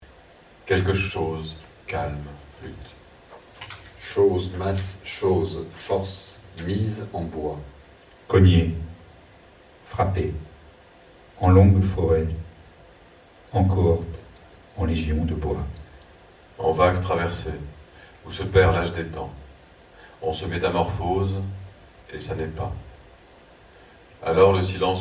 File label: chant